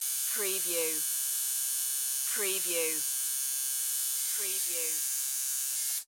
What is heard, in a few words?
Tattoo Needle Operate